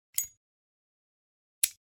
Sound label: Click Zippo slim